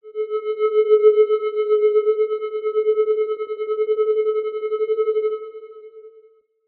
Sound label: cartoon anime power whine discharge